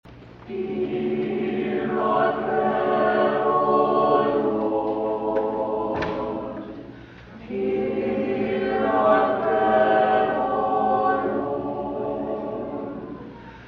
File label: choir